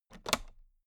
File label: toolbox latch close regular